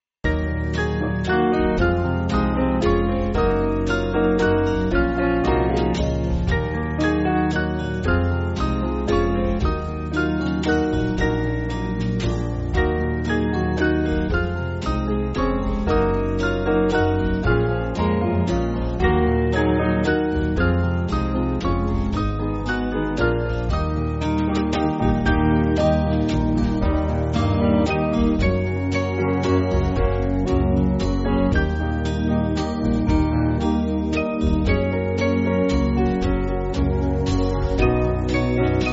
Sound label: Band